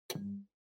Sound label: Portable Stereo Turn Off